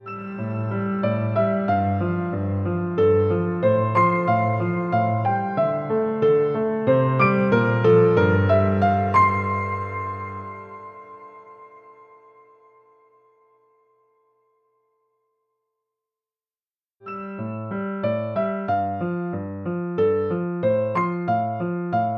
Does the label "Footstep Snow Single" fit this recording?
No